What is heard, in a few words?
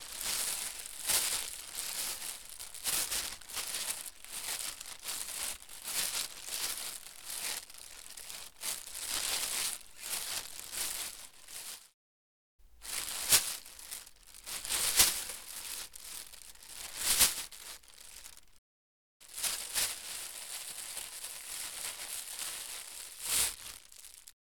Plastic Bag